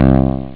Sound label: Bass; SynthSamples2